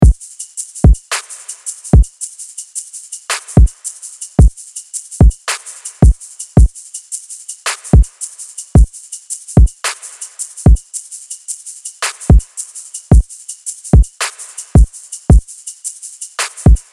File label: Drum Loop